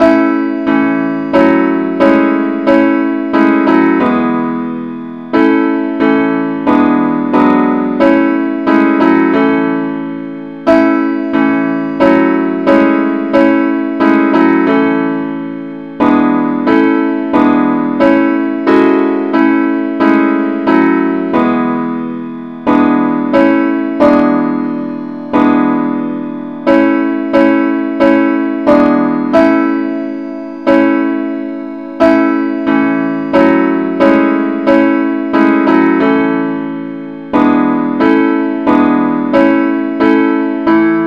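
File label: advance accompaniment key a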